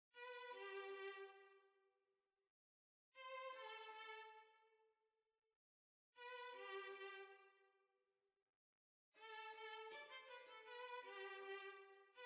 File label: Hornpipe, Violin